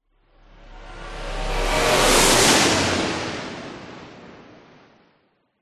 science fiction car vehicle drive zoom past engine rev